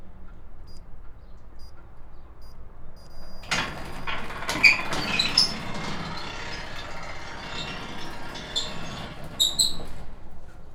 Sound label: Sailing